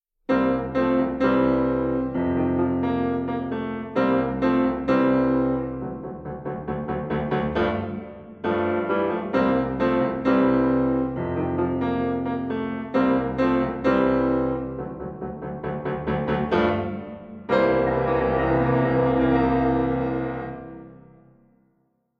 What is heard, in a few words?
rock